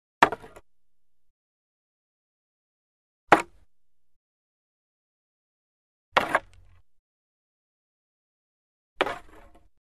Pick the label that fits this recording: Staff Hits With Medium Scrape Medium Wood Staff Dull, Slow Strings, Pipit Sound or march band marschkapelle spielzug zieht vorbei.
Staff Hits With Medium Scrape Medium Wood Staff Dull